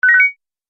Multimedia Correct, beep

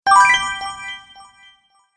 Magic Change Spell; Magic Sound Effects

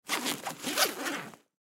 Lacrosse Equipment; Lacrosse Equipment Bag Side Pocket Unzip; Sports

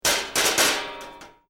Metal Drag Scrap Clanking; Metal rattles from dragging a metal bar across metal ridges.; Clank Sound Effects

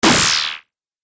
Laser Plasma Shot; Laser Gun Sound Effects

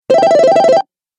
Retro Videogame Fx Award Bonus

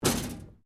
Metal Plate Impact; Material components to create more complex sounds; Materials

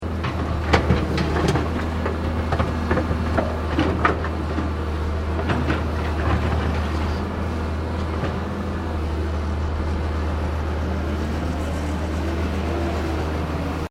Construction earthmover scooping rocks; Various construction machinery

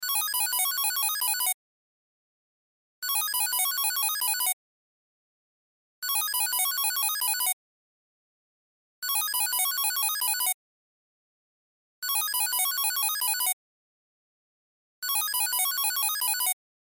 Cell Ringtone; Loop; Cell Phone; Cell Phones (Sound Effects:Electronics)

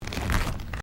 Footsteps Carpet Step; Crocs; Footstep Foley; Footsteps (Sound Effects:Human)

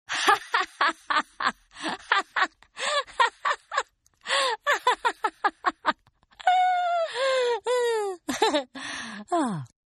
Laugh Female; Laughter; Voice; Partners In Rhyme

